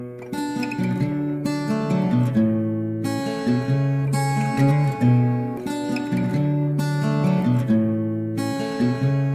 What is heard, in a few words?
Tone Relax